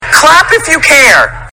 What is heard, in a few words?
clap